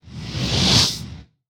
Noise Up

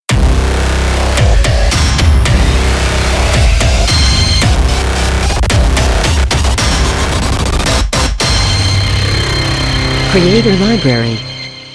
Glitchy Trailer, cinematic music

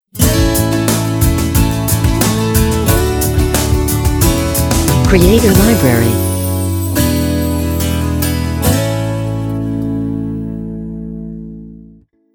Happy Ident

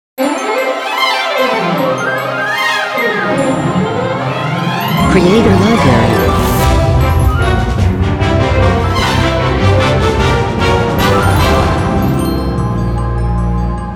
Cinematic Christmas Opener; Logo-Idents

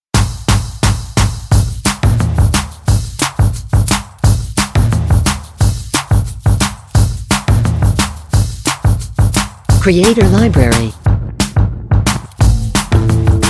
Hip Hop

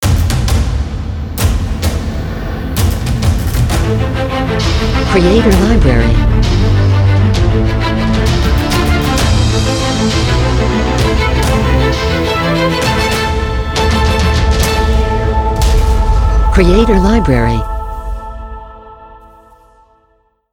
Epic Power Logo; Logo-Idents

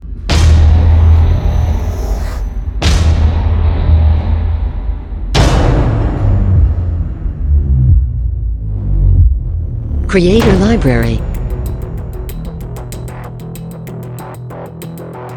Epic Blockbuster

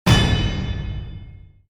Trailer Hit

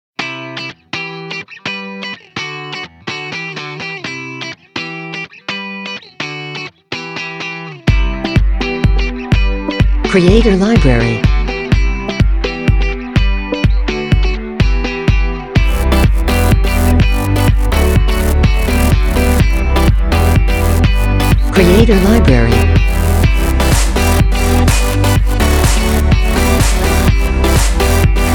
Rock That Dance Pop Crowd

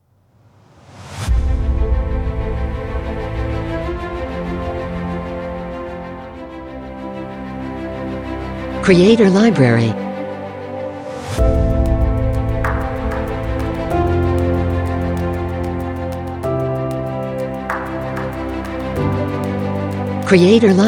Blockbuster Trailer

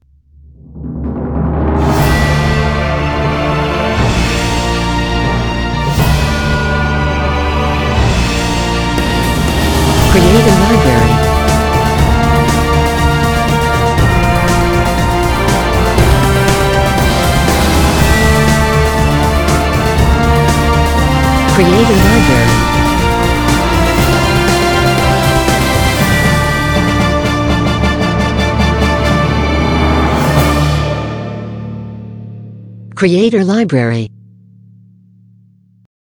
Short Action, cinematic music

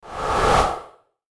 Short Swoosh

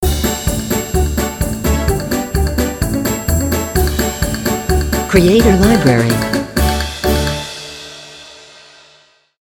Funny Story Logo; Logo-Idents

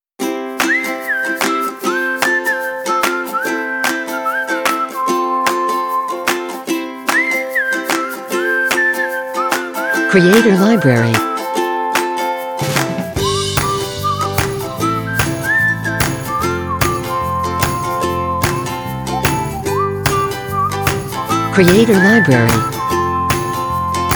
Ukelele and Whistle; Corporate

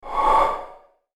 Short Swoosh

